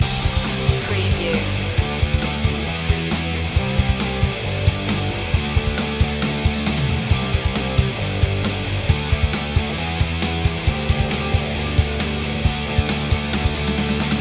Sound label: loop